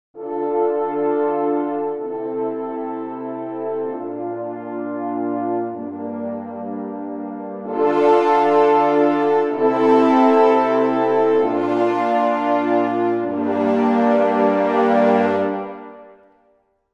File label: Horns Long Sustain